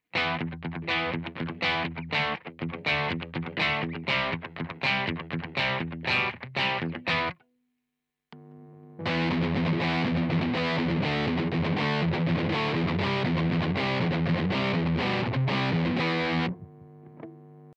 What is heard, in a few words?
Bridge Kemper Sustain